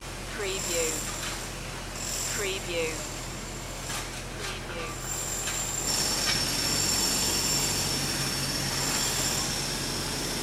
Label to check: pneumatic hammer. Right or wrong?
Right